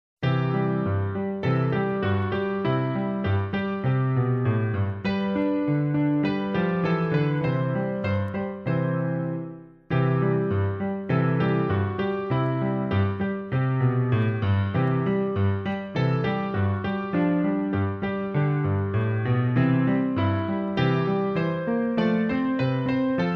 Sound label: head shoulders knees and toes music only; childrens songbook; dutch